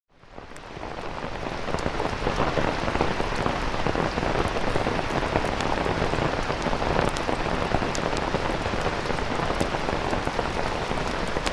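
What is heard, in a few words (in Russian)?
дождь по палатке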